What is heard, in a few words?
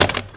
Equip Armor; FlyForInsanity